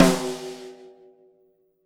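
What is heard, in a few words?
Jazz kit snare drum, Drums Acoustic; AKAI; JAZZKIT 1; 90s sample CD; USB Soundscan vol.10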